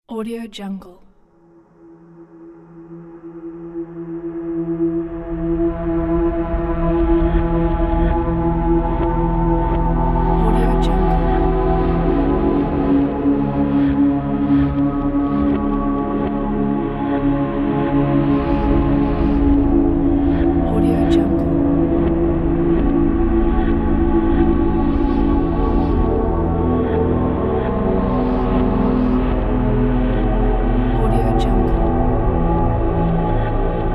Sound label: atmospheric background